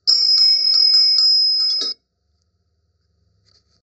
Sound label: abbey bells ringtone